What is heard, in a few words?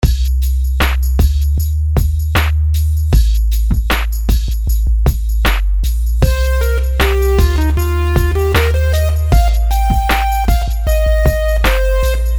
rhythms simple 16ths